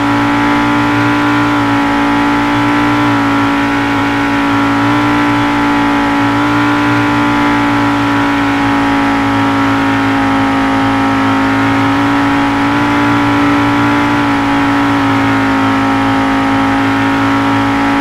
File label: high, engines; RS3